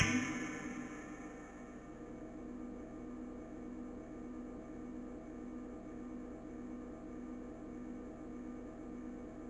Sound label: Cymb loop, drum loop; Yamaha DTXpress